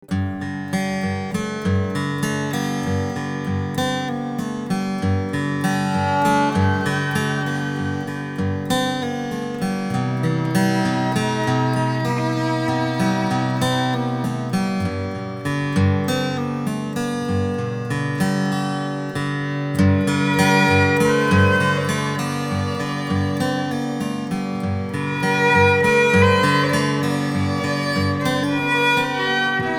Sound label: Horse Lullaby